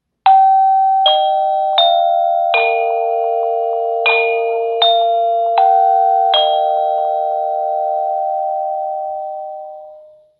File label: Westminster door chime